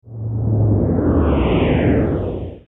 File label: Large Spaceship Pass